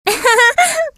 giggle; amy rose

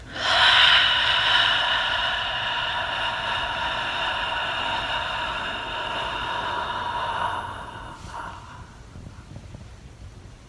longest sigh

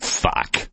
hitsound

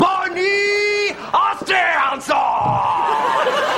ringtone

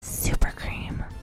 kitty rawr

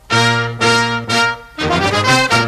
riff; vaxco da gama

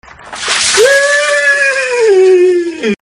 ihhh

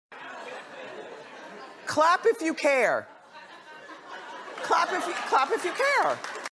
clap

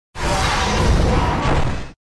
speaker screech; titan

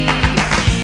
clap; friends

